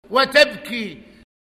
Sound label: loop